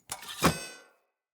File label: Cleansed Spear Equip; Weapons; Player